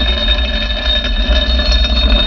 metal Heavy On Stone; vampire demo; environment

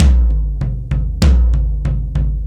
Tribal Tom; ACID MUSIC; Drums

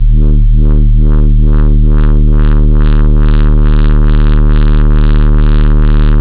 synth bass; da capo vol1; instruments

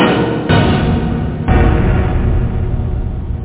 orch

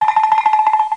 Rattle

